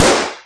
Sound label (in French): son ballon